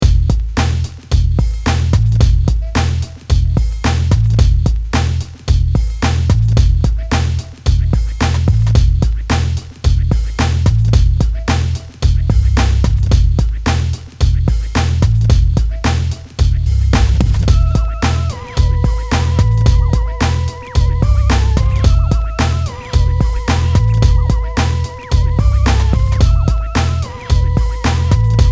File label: BOUNCE HOP ALT